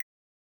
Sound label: ui